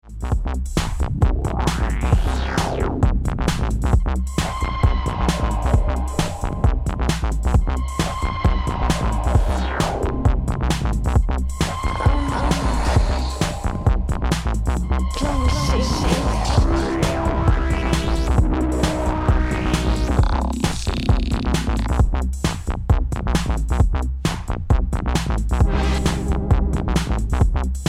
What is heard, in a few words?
Original Instrumental